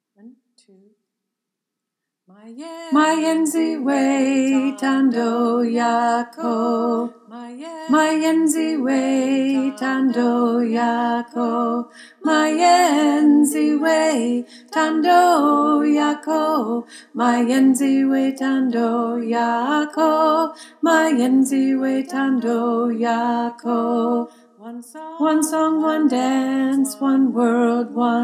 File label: Alto, choir; freedom choir